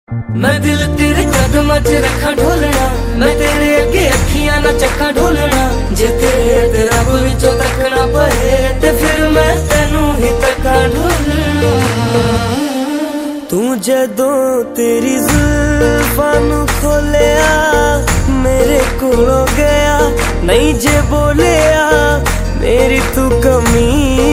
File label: hindi ringtone